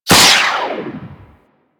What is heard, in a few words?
Skate Dash; Warframe; Corpus Modular Crewman; Skate Movement